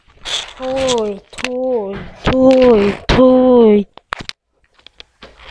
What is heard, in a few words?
toy